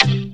VOCODER DRUM; Rubber Chicken Software Sample Bank; DRUMS + PERC

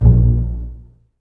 BSS A#1 Pizz, String Ensembles Basses; Miroslav Vitous

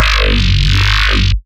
Growl Bass; neuro; BIG5AUDIO

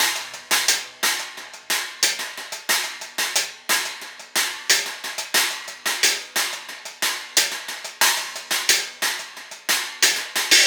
Cymbal and Hi Hat

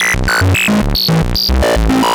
Random, LFO & Noise

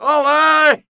flamenco vocals, male; Best Service ProSamples vol.46

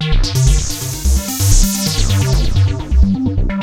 PHASER, DANCE; Pro-Rec - Synthsonic; Z1 DANCE

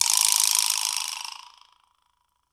vibraslap; Big Fish Audio; Ross Garfield Drums VOL-2

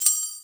SHAKER, INDIAN PERC

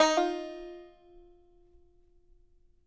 BANJO NOTES, F 4; Sampleheads; Whole Lotta Country 1 & 2

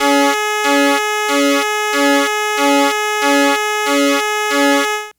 Analog Pulse, Synths; Sonic Foundry (Sony Creative Software); Electronic Point Blank

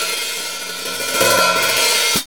HAT INTRO, VINTAGE FUNK; Zero-G; Funky Elements

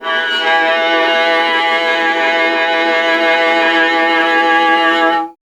viola E; Miroslav Vitous; Solo Instruments VOL-1

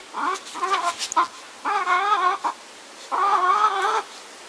Chickens; outdoor

